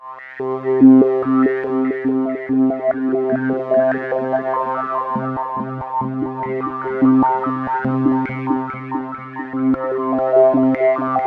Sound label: Roland JV 2080 LFO C2; MISSION LFO